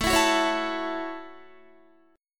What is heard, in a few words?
A 6th, Guitar, chord; 17,19,16,18,16,16; 4E,4A,5D,5G,5C,6F; strummed